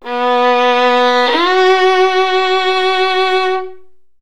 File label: violin